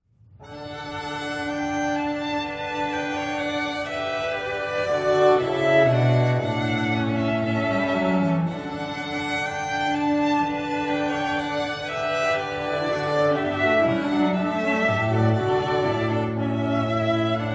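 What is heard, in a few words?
chamber music string quartet; Ode to Joy; Classical; Heritage String Quartet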